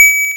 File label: Hard PCM Chip; chiptune